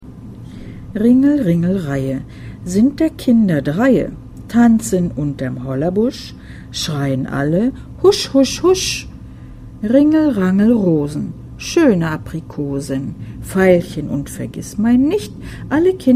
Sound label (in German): Ringel ringel Reihe